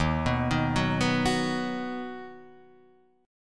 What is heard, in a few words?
D sharp Minor Sharp 5th D sharp down Guitar Half Step Down